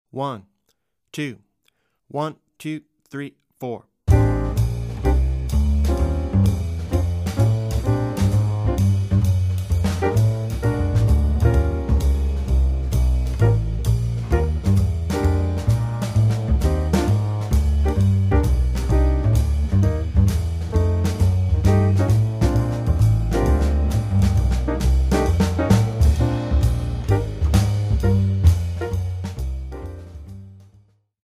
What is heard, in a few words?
Medium Blues Play-a-long